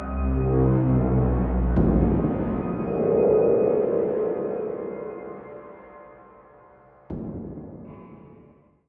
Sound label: Coop Lose